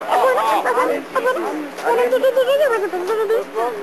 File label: sing